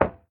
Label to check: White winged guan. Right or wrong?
Wrong